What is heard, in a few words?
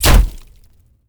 hit, damage; DCS